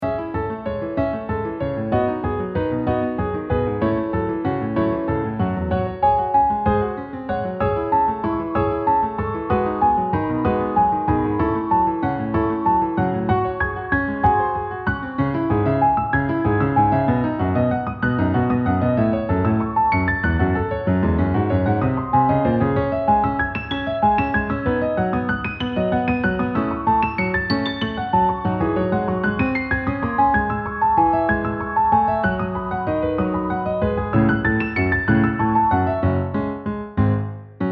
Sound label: piano; apparitions ball